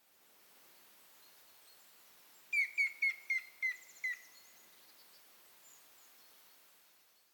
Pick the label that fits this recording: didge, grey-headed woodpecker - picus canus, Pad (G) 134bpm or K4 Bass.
grey-headed woodpecker - picus canus